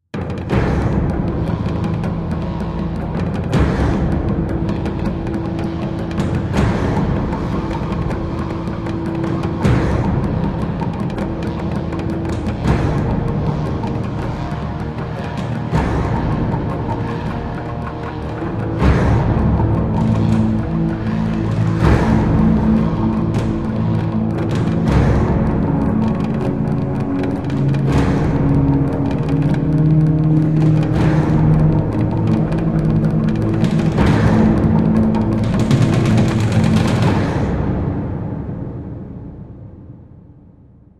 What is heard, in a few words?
Orc Victory; warcraft